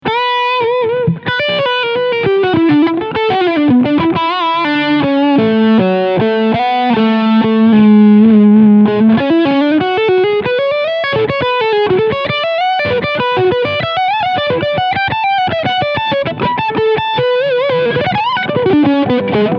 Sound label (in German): AZ 2204 Leadsound Steg Pickup; Ibanez